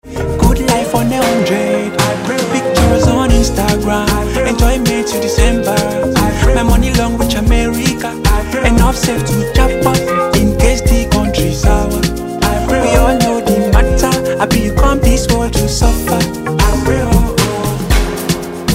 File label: Instrumental Hook